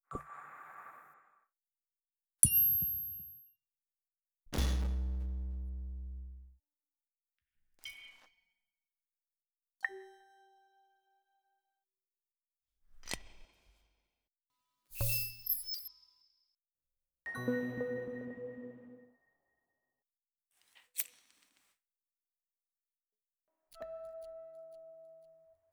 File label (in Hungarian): perc osszes